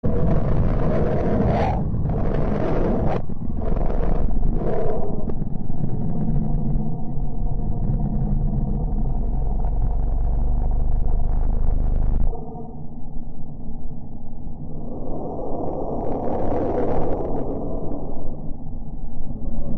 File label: Symphony of planets